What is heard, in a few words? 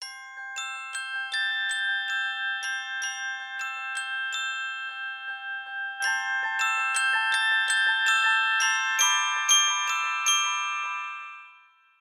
bright morning ringtone